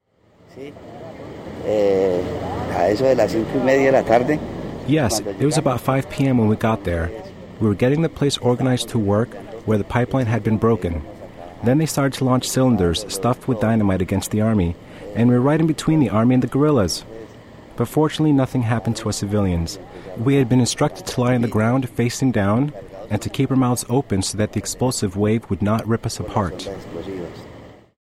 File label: dynamite attack